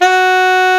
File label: SAX F#3; Best Service Alpha Dance vol.2